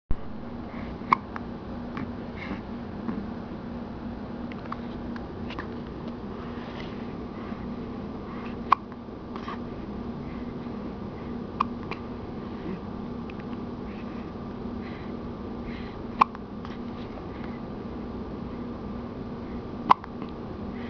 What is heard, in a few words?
hiccup